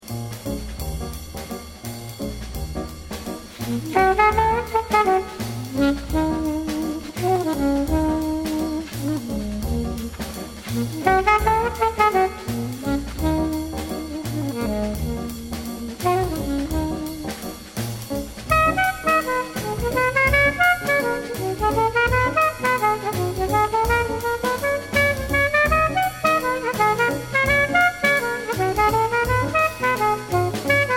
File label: jazz quartet